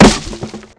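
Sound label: grass; Mafie 1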